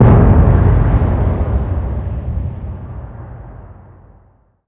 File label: explosion grenade plasma low